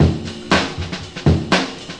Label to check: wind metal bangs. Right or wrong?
Wrong